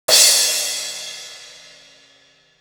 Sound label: Cymbal; 90 sSampleCDs; Coldcut's Kleptomania VOL1; Drum One Shots